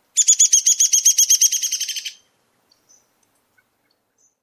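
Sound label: Downy Woodpecker whinny call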